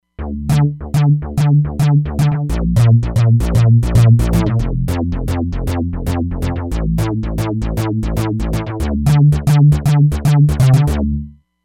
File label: Gashed Bass; Roland Fantom XR